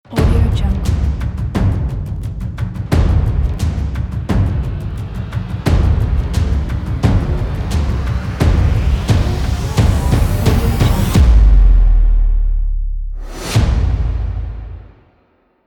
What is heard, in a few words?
epic percussion blockbuster logo